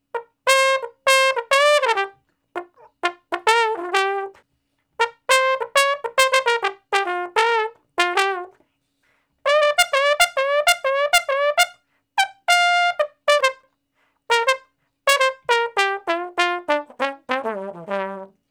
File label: Trombone Straight (G); Solos